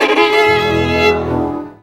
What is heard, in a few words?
VIOLIN HITS; Best Service; World Colours